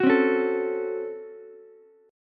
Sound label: C sharp Major 7th Suspended 4th Sharp 5th C sharp, Ukulele; strummed; chord